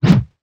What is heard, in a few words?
colossal Sinking